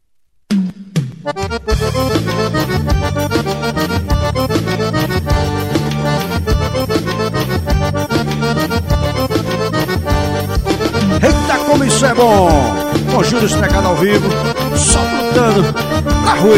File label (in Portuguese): teclados